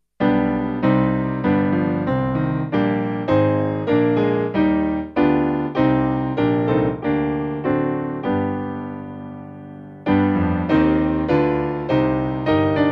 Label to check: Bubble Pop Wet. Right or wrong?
Wrong